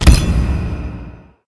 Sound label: match start; summer pockets (pink)